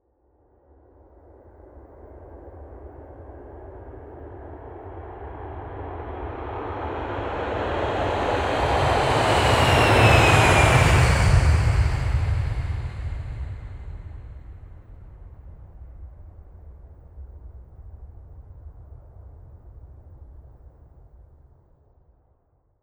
747 jumbo jet taking off

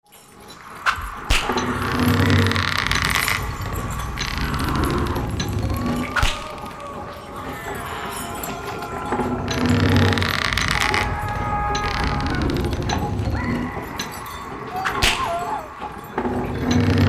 torture chamber

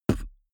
beatbox bass drum

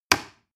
plastic button little push heavy

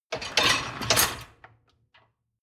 dishwasher lower tray draw in short

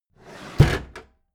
packed drawer smooth clatter open heavy

